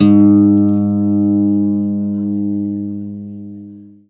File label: ZITHER BAS; F2; Best Service; Alpine Volksmusik